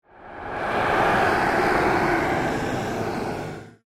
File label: Ghost Alien Roar, Ethereal; Horror; Ghost Sound Effects